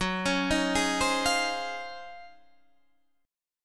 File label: Eb Minor 13th Gb